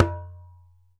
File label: ASHIKO, African Drum; E-MU Producer Series Vol. 7 – Old World Instruments